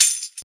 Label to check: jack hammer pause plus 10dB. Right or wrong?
Wrong